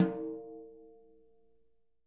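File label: jazz snares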